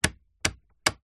household heater fan small speed control dial turn 3 clicks slow; zapsplat